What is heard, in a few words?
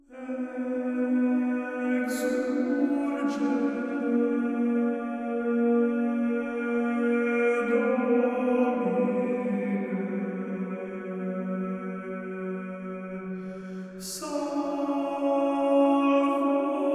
Choir